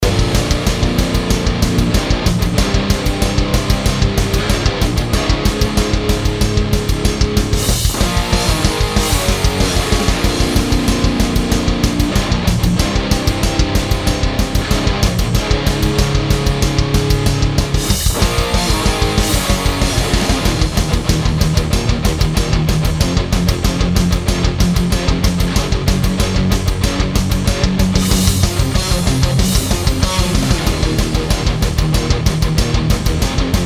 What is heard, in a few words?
chunk example; diezel herbert